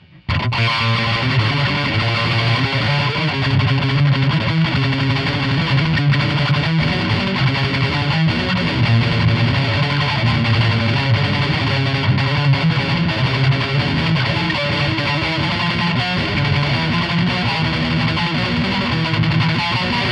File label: riff